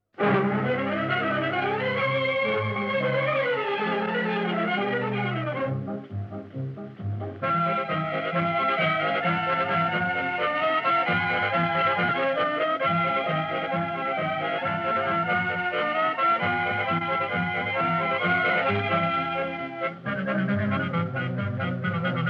Instrumental Music; Baap Re Baap 1955

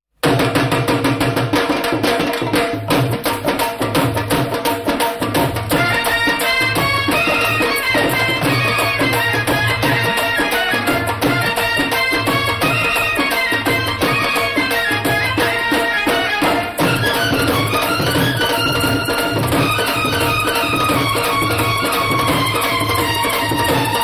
Instrumental Music

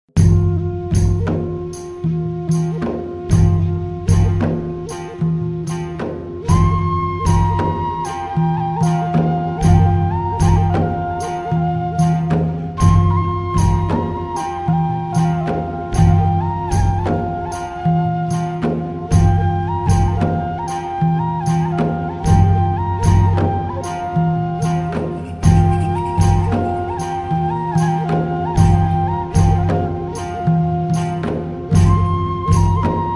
Tribal Dance; Aagun Pakhi 2010

